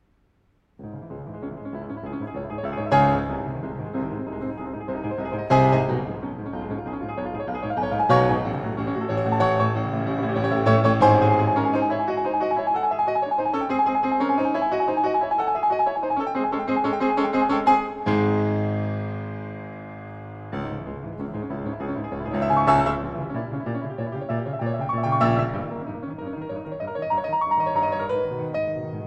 Piano Sonata No. 14 in C Sharp Minor 3. Presto Agitato; Op. 27 No. 2; Moonlight